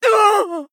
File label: Female Voidwalker Death